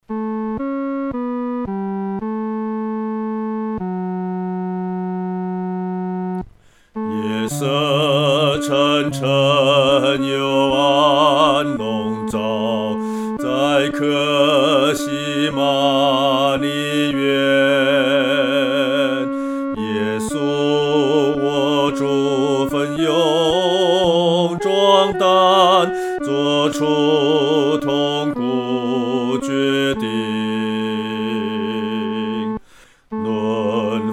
独唱 第二声; 到客西马尼

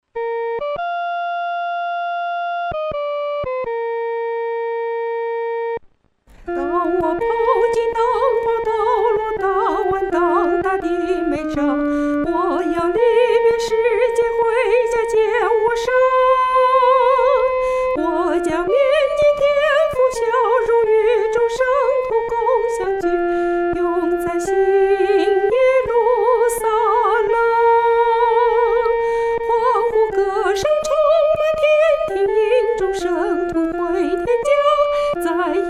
独唱（第一声）

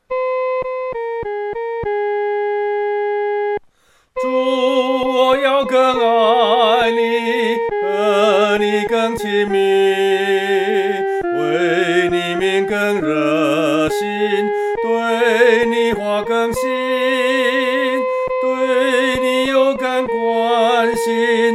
独唱 第一声; 主！我要更爱袮

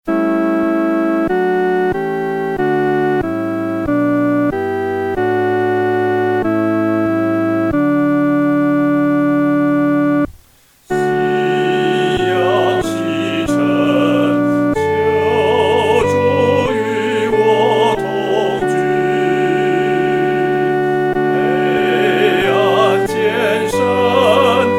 独唱（第一声）

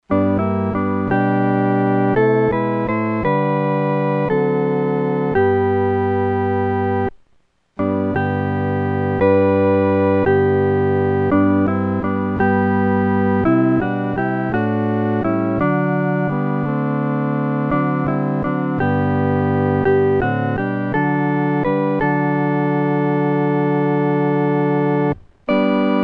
合奏 四声部

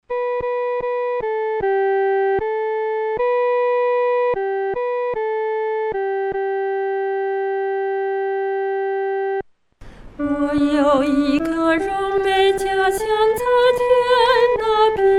独唱 第一声

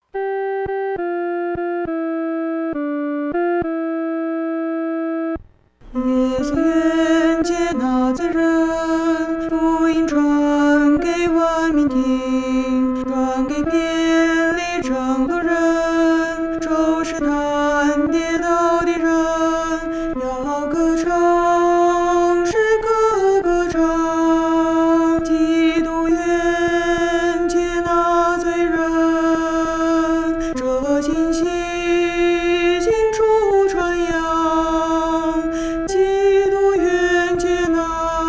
独唱 第二声

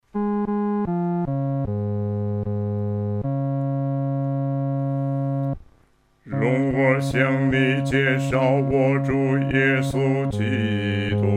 独唱 第四声

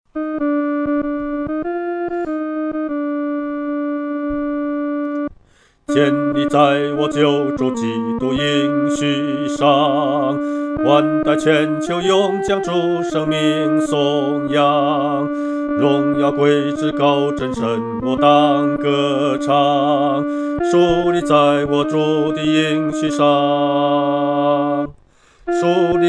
独唱 第二声

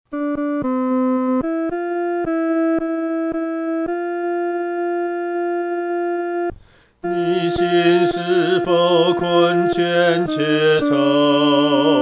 独唱 第二声; 当转眼仰望耶稣

